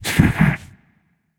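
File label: "heh heh", nonverbal; smite; khepri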